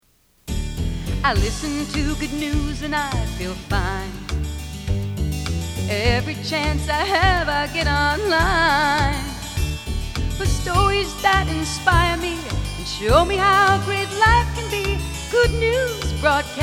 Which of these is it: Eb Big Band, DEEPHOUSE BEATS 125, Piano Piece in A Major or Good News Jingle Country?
Good News Jingle Country